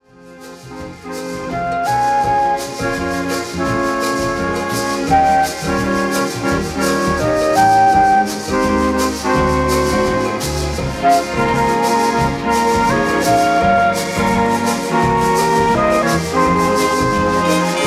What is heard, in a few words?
Latin Beat